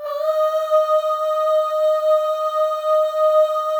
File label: AHS, Symphony of Voices, FEM